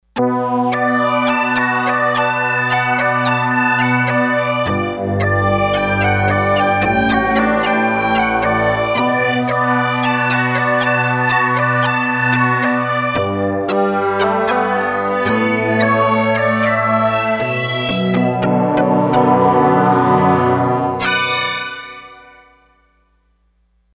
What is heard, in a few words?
Velo Oct Synth, Roland D-10; Factory Patches